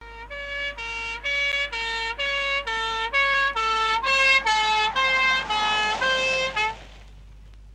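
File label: European police car driving with siren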